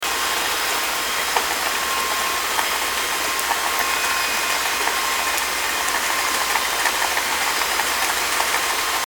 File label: loud boat